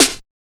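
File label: snare drum, Electro 125; Big Beat Drumloops; USB Soundscan vol.25; AKAI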